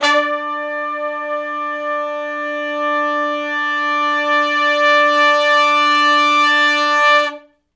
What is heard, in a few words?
2 measure Long Note w Swell (D) unison, Horncraft; Sonic Foundry (Sony Creative Software); Sections